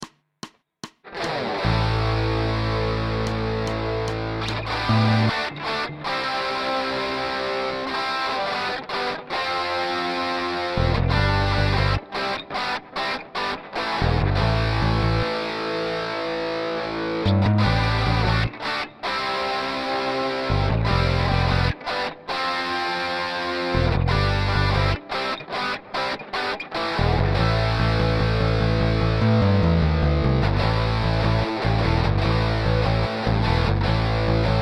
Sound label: drumless